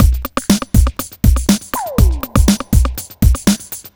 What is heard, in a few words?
121 bpm full, drum loops; Nu Skool Jam; Nu Dance Electro